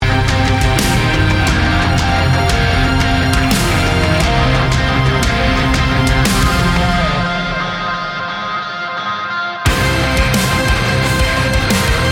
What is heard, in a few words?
Instrumental